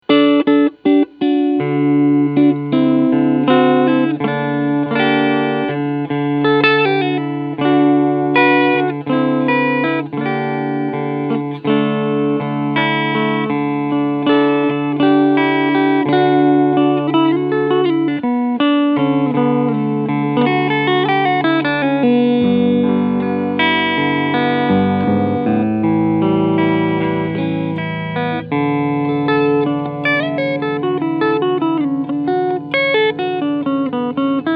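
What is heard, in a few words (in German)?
PRS SE Starla Cleansound Front Humbucker, E-Gitarre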